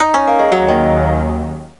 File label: Chinese Lose; mystery tales time travel 2010 nds gamerip